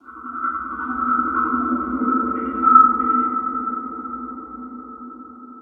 Ambient; Barotrauma; Room FX

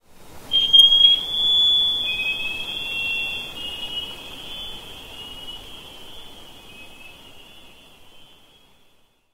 Sonar Sweep; Barotraumatic; Carrier Leviathan

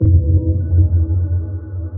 sonar Ping Air Far Shuttle Directional; Barotrauma; Real Sonar

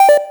Confirm Beep Boop; Electricity; Thalassophobia Restored

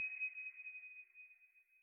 sonar Tail Water Far Directional; Barotrauma; Real Sonar

